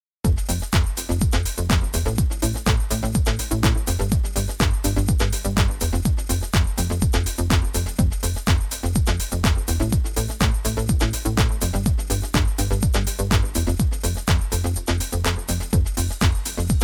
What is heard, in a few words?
Breakbeat